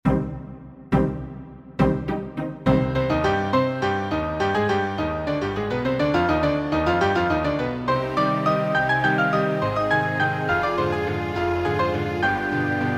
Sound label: accompaniment 69 bpm; performance tempo